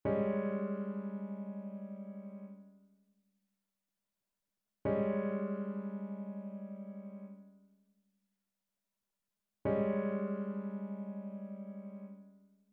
trichord; trichord identification

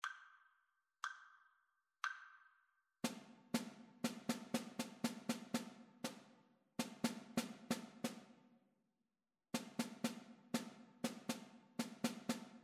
rhythmic dictation

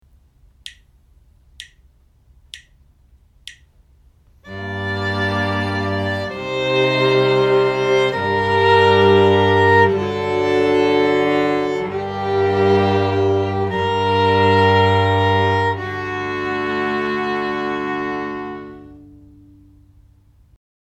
harmonic dictation; ear training

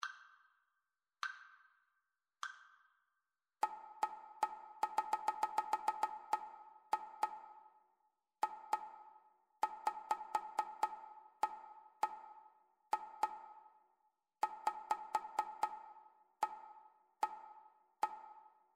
rhythmic dictation; ear training

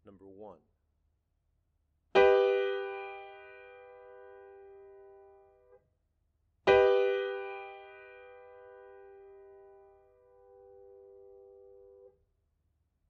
chords; eartraining

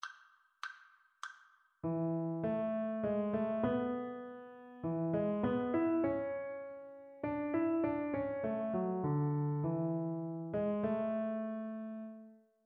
melodic dictation; ear training

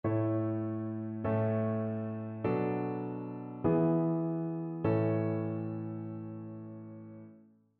harmonic error detection; ear training

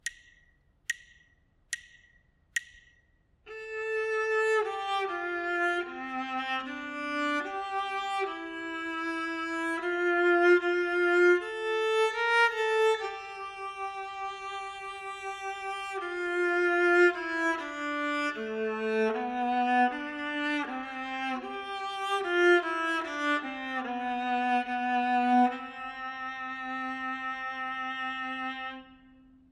melodic dictation

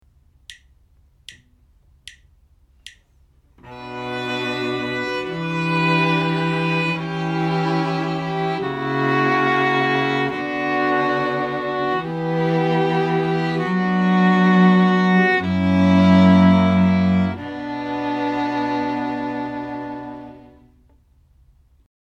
harmonic dictation; ear training

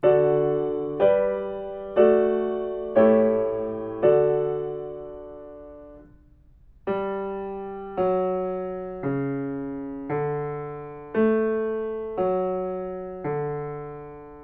melodic fragments; ear training

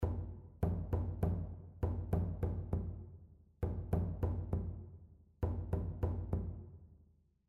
rhythmic error detection; ear training

